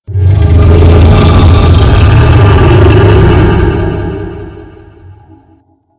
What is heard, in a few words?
Roar; mvmthemes; RustTitan